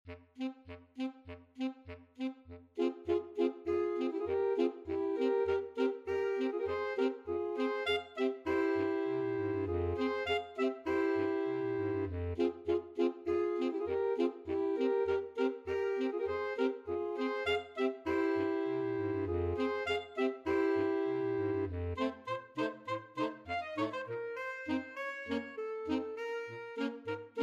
flexible mixed ensemble, 4 players

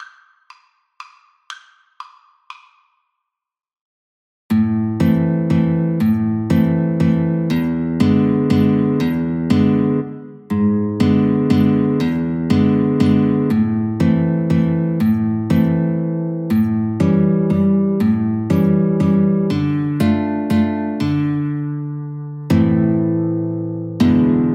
Guitar Duet

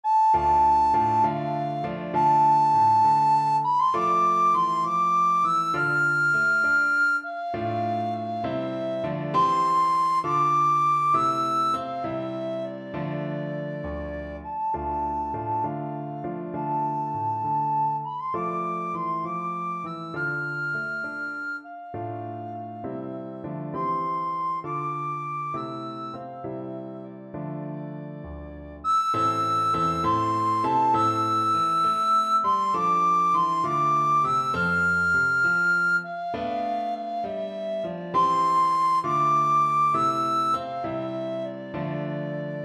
upon a summers day, recorder

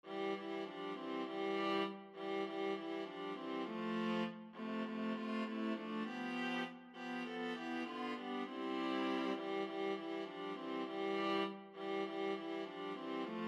jingle bells viola trio

